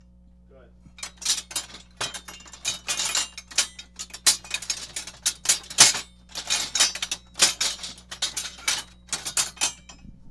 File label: Metal Mess